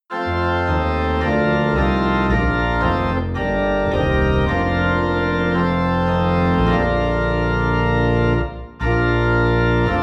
Organ Low in B Flat; Abide With Me

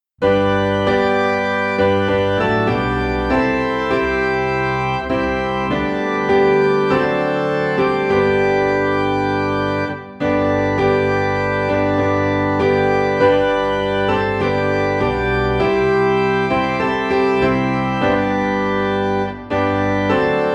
Piano and Organ in G

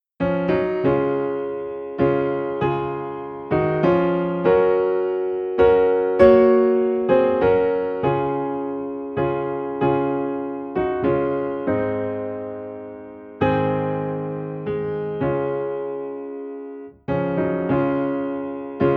Piano D Flat; Just As I Am

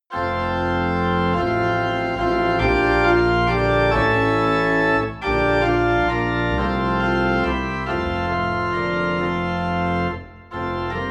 Organ Low in F

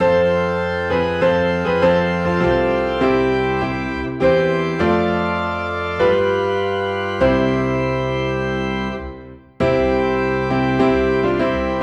Piano and Organ in C; At Calvary

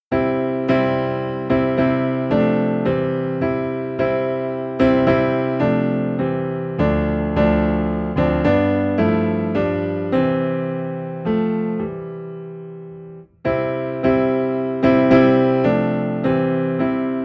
Piano Low in C

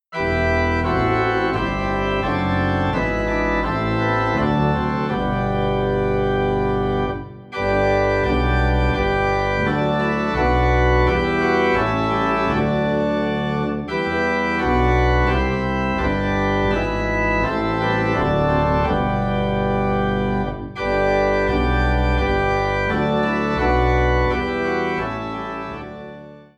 Organ Low in G; A Mighty Fortress Is Our God

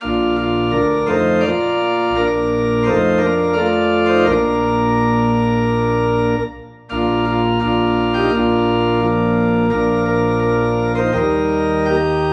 Organ in B Flat

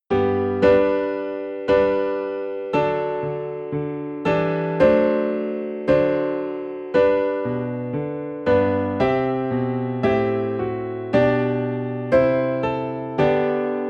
Piano in G

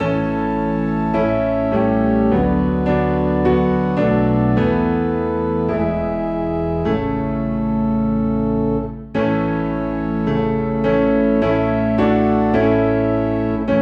Piano and Organ Low in A

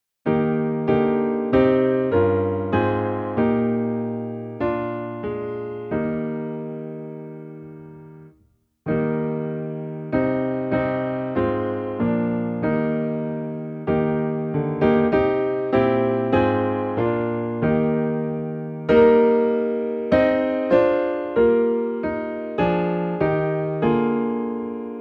Piano in E Flat; Fairest Lord Jesus

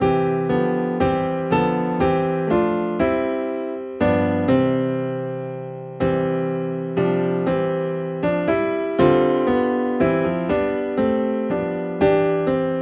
Piano Low in C; Come Thou Long Expected Jesus

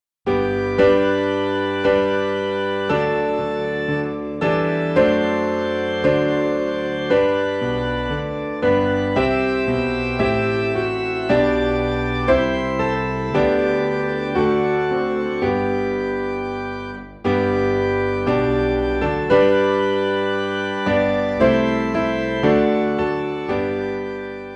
Piano and Organ in G

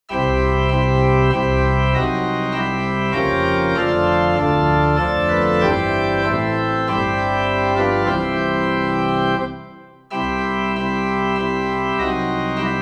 Organ Low in C; O Little Town of Bethlehem; christmas

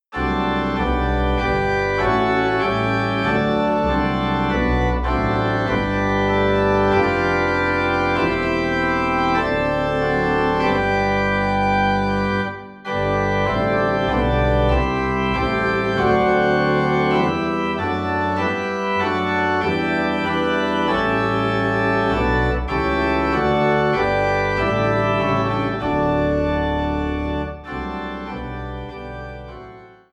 Organ Low in G; May Jesus Christ Be Praised

